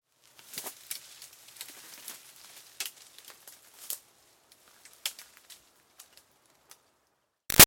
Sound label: Leaves Moves Feet Snaps; Objects